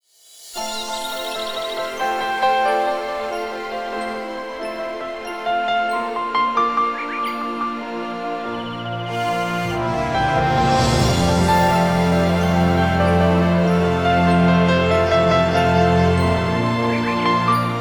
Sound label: Tranquil ringtone; Nokia; Audio themes